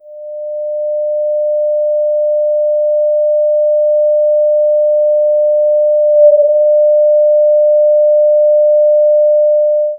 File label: new element discovered in, positive